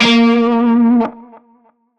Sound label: Shawzin Flourish Oct Two A Sharp; Music; Warframe; Narmer Shawzin